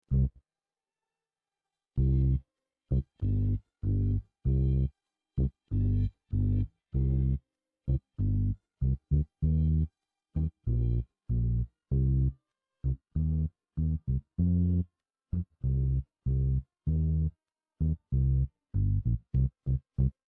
bass

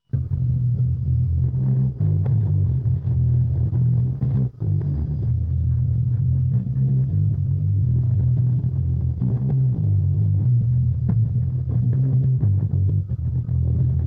bass

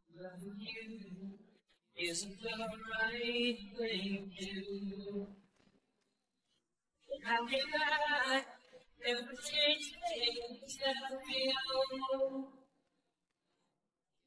vocals noise

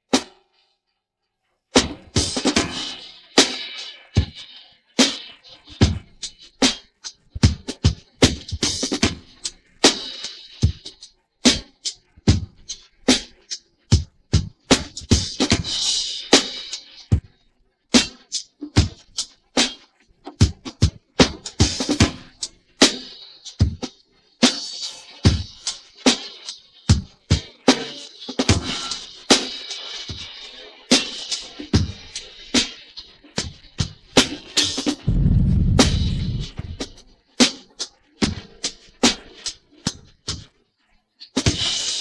drums

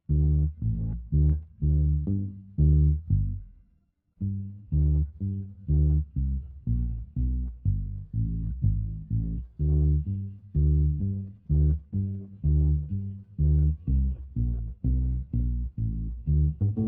bass

